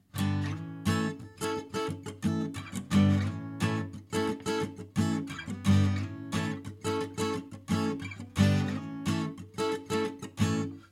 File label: sliding chords, guitar